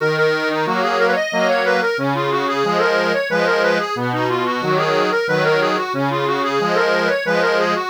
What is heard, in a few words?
bgm Accordion; ゆめ2っき